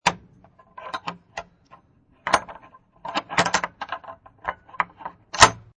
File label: Wood Gate, Wood gate unlocks after a little struggle; Gates; Doors